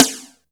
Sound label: HOUSE DRUM SD; AKAI S6000 CD-ROM - Volume 1; DRUM RYTHM